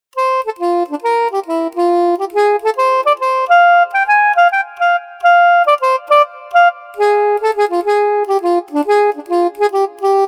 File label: Soprano Soft, sax; Montage